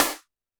Snare, Roland TD-20

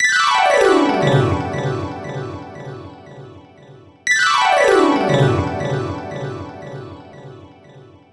Gliss 2fer Gliss, lounge, 118; Zero-G Kocktail Kollection; Construction Kitz (Lounge); Gliss 2Fers Mixes 118

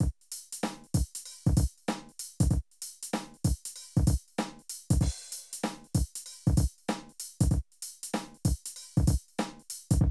Drum loops, Streetsoul

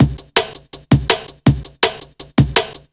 Break, Loops; M8

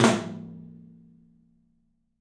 13 TOM, 3D Drums; 3D Sound

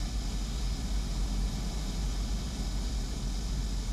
Ambience Loop, outdoor

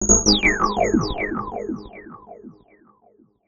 Synth FX

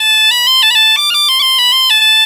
AM BPB, Celtic Flavours, BAGPIP090; USB Soundscan vol.42; AKAI

